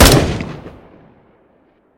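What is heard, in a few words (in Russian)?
уходящее вдаль эхо после пистолетного выстрела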